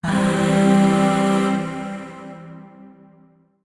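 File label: Shawzin Pentatonic Major Chord; Warframe; Zariman; Void Shawzin; Chords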